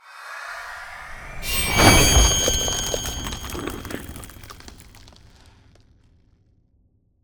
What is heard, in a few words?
Ice Spell Whoosh Frozen Pierce, Cold Whooshes; Arctic Aura